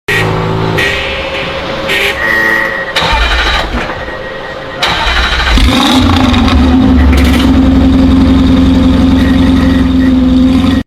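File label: Jaguar, exhaust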